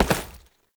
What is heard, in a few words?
Step Default; Warframe; Oraxia; Foley